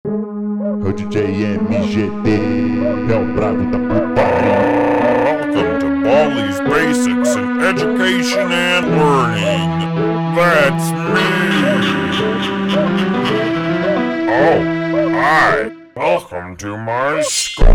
Funk slowed

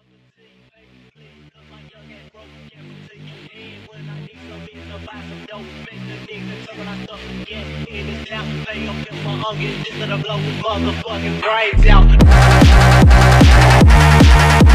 Phonk Sped Up

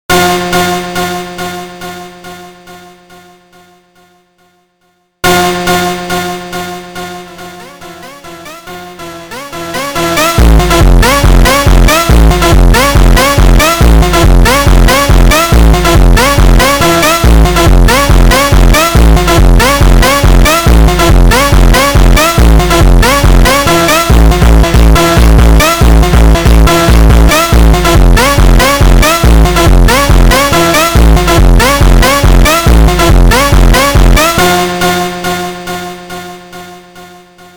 Funk Instrumental

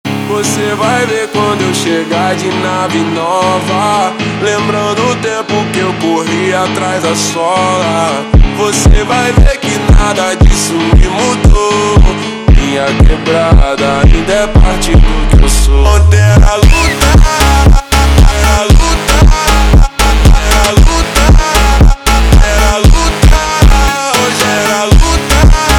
Montagem slowed

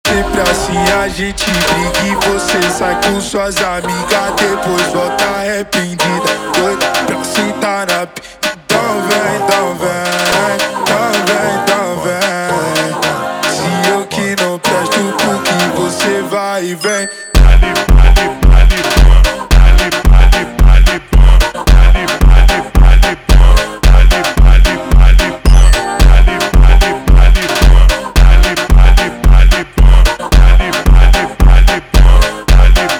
slowed

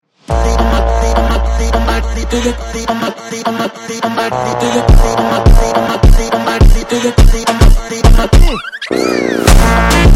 Funk super Slowed

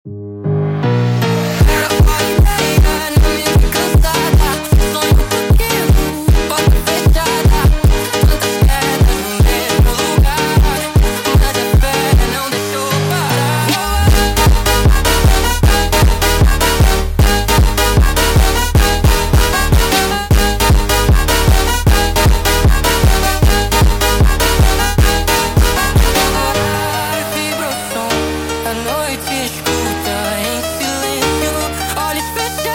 Montagem sped Up

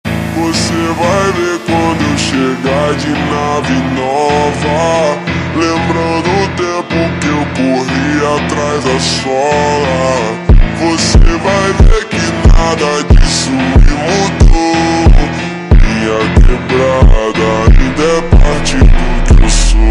Montagem ultra Slowed

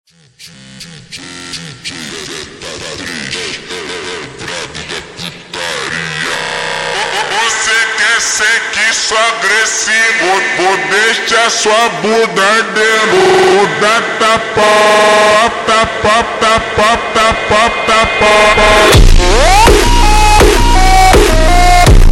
Deixar hardstyle Remix ultra Slowed

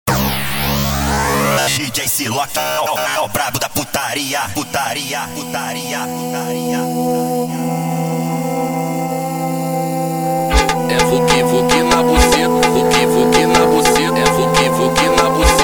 Alien Horror Funk

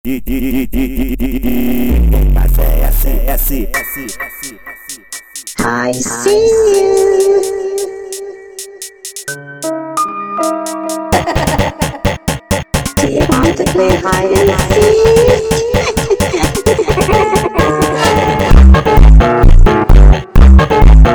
Funk

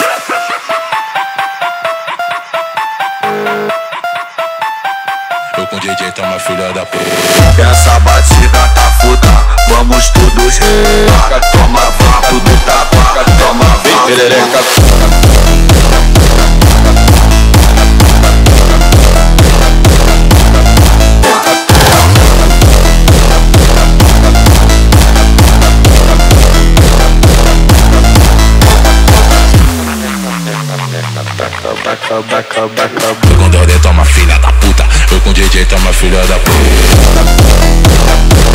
RISADA Hardstyle Remix

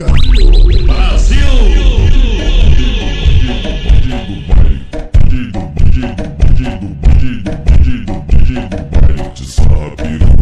Brazil Slowed superbass reverb slow

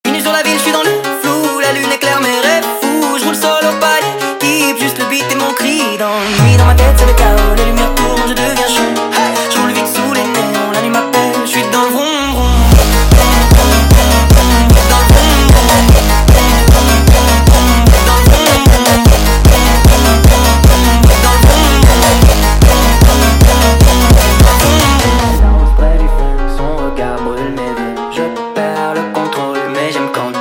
Montagem sped Up

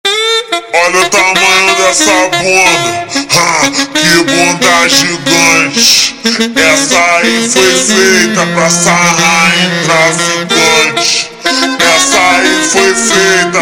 Funk ultra Slowed

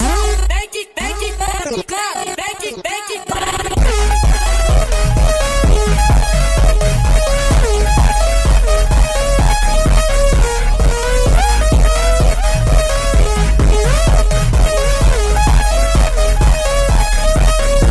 deep flanger echo

